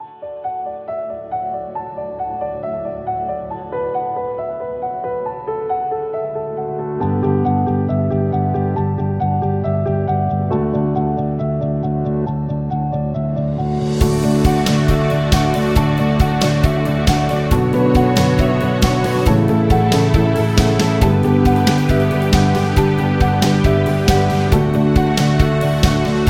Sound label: Cinematic Piano; corporate music vol 7